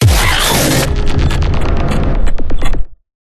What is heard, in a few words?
CINEMATIC IMPACT; PMSFX